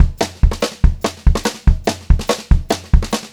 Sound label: 144 SPEED, Pop-Rock Drumloops